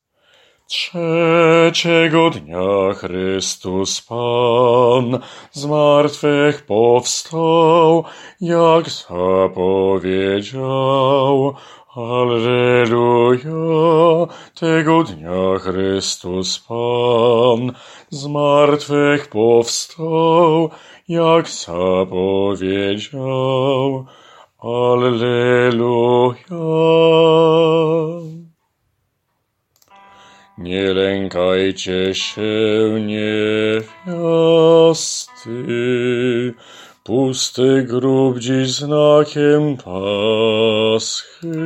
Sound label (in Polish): Piesn o zmartwychwstaniu BAS